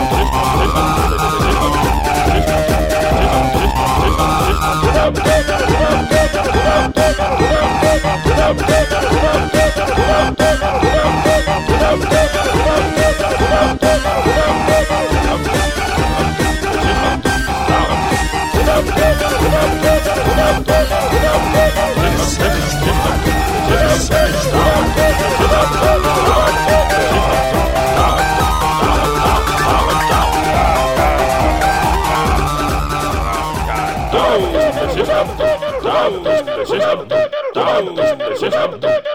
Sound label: Techno